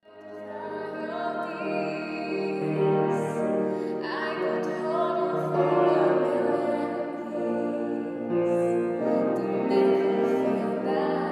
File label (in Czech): zpev klavir